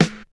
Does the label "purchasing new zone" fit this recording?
No